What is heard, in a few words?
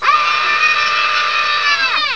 kids scream